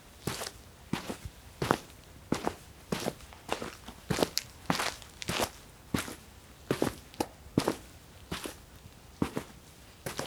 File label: Walking on pavement; SFX; IRL Recorded